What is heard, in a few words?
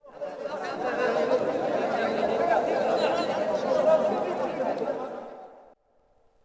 City Crowd; Ambient; Sid Meier's Civilization 4 Complete; Beyond the Sword